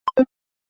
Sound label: message